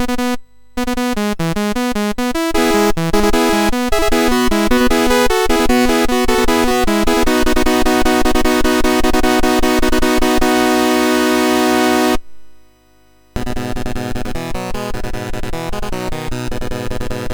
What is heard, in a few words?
sid, MOS6581R3; Creeps